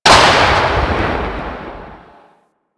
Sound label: Submarine Light On; Warframe; Grineer Ocean